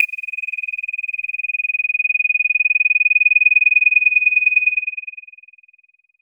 ultra short dry ui click

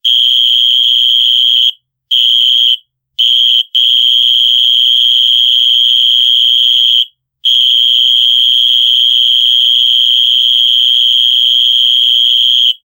alarm danger red light, warning

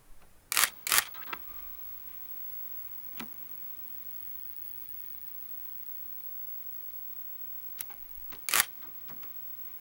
closing dslr camera scree

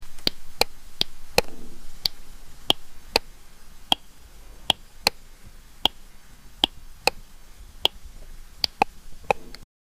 sound effect of an eye, body

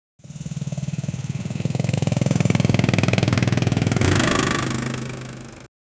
motorcycle sound in backg, kids laughing

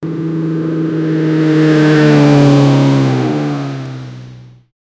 supercar drifting on the

